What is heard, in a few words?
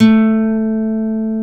JV80 guitar A3; East West - Global Sounds